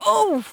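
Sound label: f oof, female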